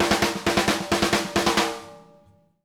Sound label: SNARE, drum fills; Sampleheads - New York City Drumworks VOL-1